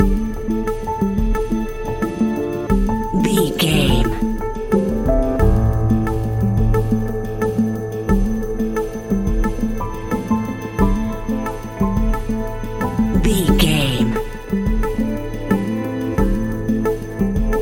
electric current affairs news and tension music, A cool piece of electronic music perfect for the nightclub